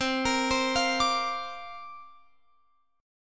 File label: C 9th Suspended 4th C down Guitar Open F; C9sus4 arpeggiated